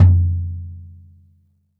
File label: bop kick - snares off; JazzFunkKit